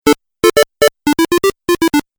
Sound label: Game Music Phrase; Video Game Sound Effects